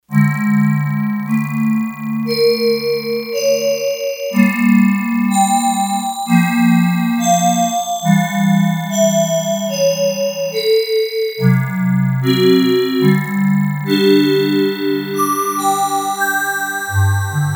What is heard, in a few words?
Yamaha TX802; Factory Patches; Voices